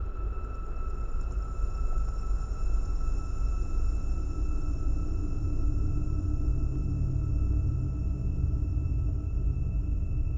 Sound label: Room Tone